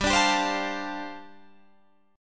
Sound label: G 7th Suspended 2nd Suspended 4th, Guitar Half Step Down